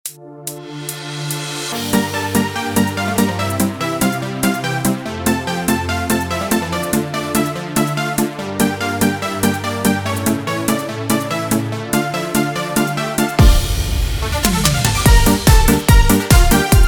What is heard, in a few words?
Style Yamaha; yamaha psr sx600